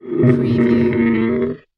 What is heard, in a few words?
Monster Groan Pain